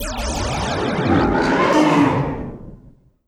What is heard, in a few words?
vortex dragon; Politburo